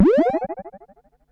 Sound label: Perc Synth Moog Delay